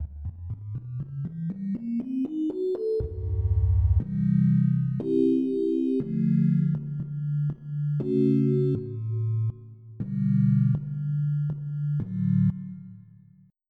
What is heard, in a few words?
Rhodes; FF7